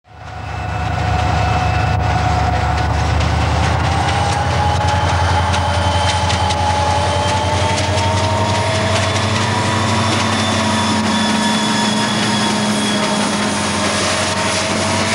Spanish Railways Macosa built GM, 333.066 accelerates very hard away; Diesel; RENFE 333066